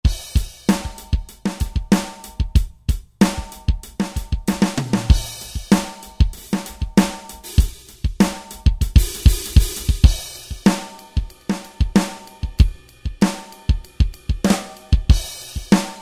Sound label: Ringer KIT; Roland SR-JV80-10 Bass & Drums